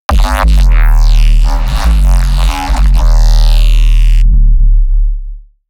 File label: Sound Design, bass